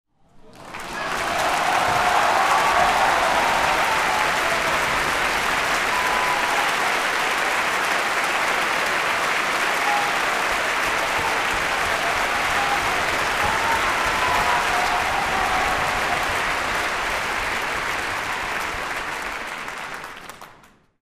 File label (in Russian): zvuk ovacii posle vystuplenija